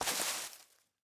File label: foliage hit; Teardown; PS5 Windows Xbox Series X|S